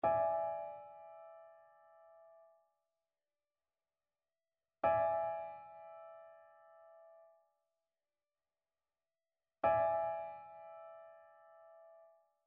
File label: trichord; trichord identification